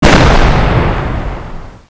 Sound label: explode